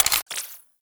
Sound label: Blastor Pistol Mech